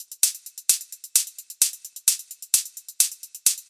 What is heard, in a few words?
Hihat 130 bpm; Loops; Drums; Top; Convergence; Ableton Live 12 Lite; Core Library